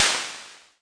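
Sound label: pt30 cymbal, CASIO PT-30; LO FI and 8 BIT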